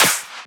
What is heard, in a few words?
Clap; FLPRO